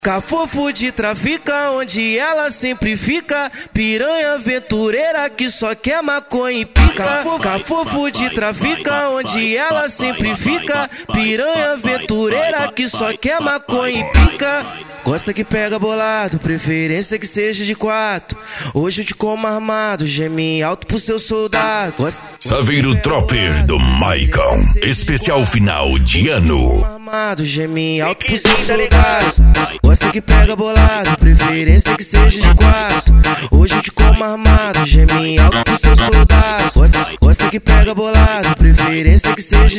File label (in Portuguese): funk agressivo funk